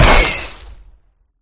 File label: pop; flood